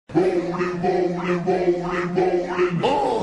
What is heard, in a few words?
rolling